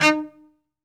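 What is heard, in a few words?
SOLO cello, Strings; ILIO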